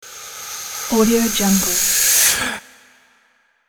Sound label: breath whoosh